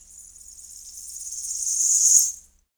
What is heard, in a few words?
jingles; Roland LA; ETHNIC